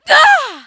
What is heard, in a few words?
pain